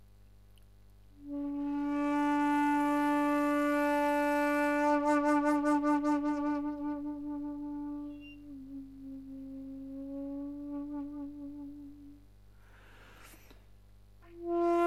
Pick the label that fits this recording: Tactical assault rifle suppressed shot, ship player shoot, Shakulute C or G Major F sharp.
Shakulute C